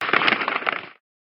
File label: skelly pain